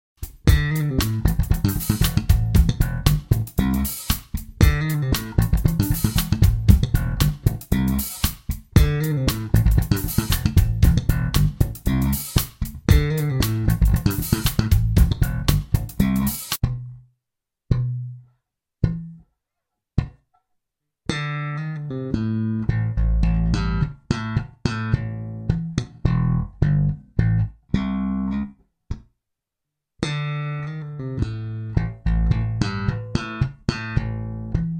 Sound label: Slap bass in A w double thumbing